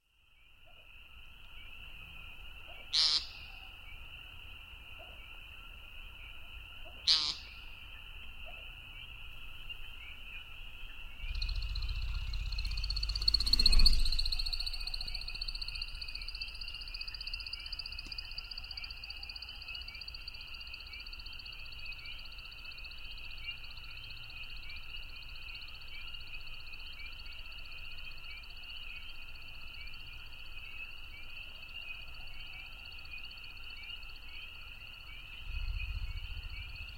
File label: american woodcock stereo flight with peepers